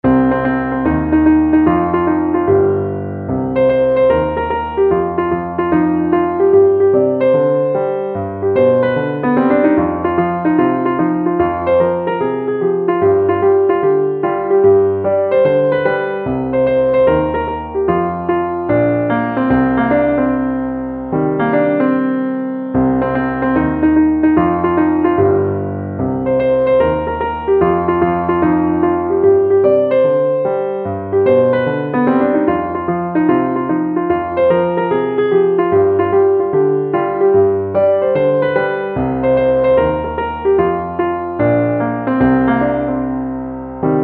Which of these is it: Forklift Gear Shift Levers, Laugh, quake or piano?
piano